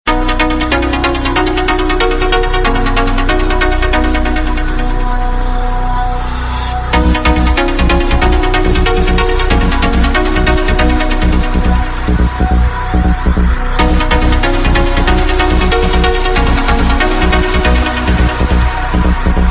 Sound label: Dj Mixer